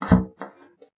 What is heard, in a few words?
BASSOON; Xsample VOL-6